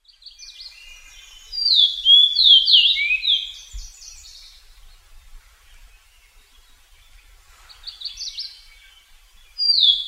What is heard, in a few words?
fox sparrow